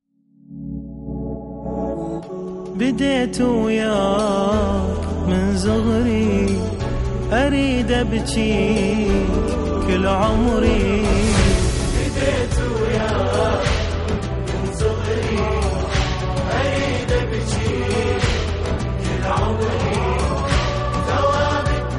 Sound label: nawha arabic